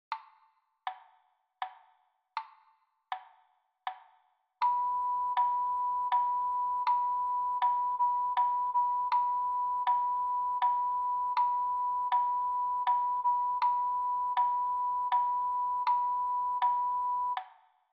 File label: Rhythm Patterns